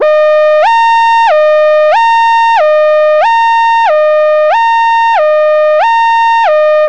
police hi lo siren

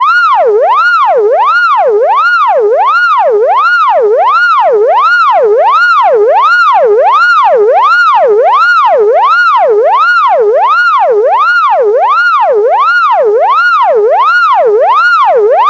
electronic siren

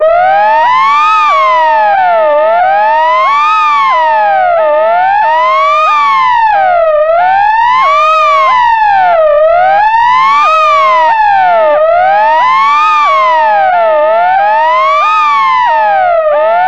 ambulance wail siren

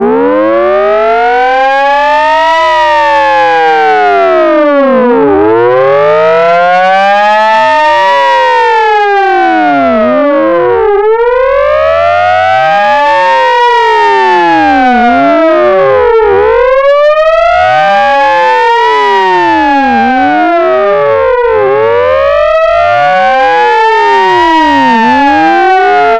fire truck piercer siren

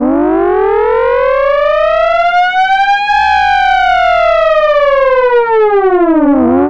civil defense alert siren

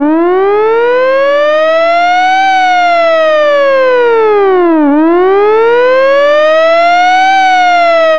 vintage hi lo siren

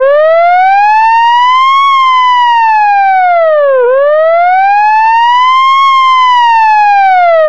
short alert siren

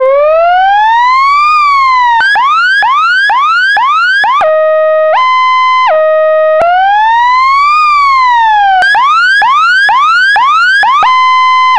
emergency wail siren

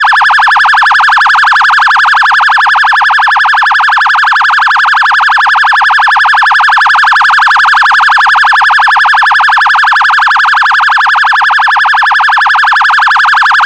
electronic phaser siren

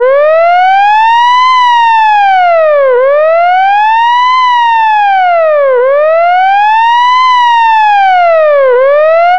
short alert siren

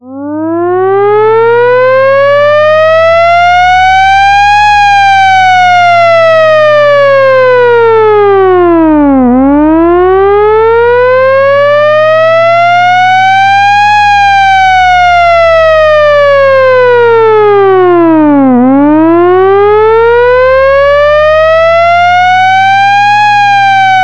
air raid air raid siren

